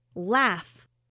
laugh

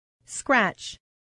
scratch

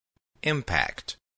impact

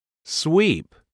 sweep

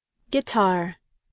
guitar

